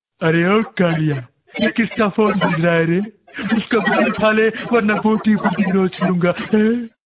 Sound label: Gabber